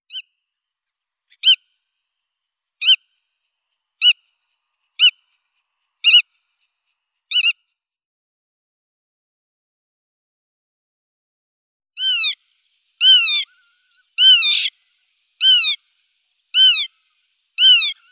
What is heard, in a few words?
veretennik bolshoi limosa limosa